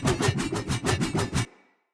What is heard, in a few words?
Sphere Dual Sword Throw Loop, Weapons, Swords; Player